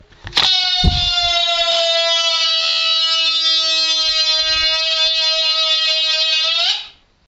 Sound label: Releasing Balloon